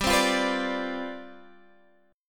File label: C Major 7th Suspended 2nd Suspended 4th G, chord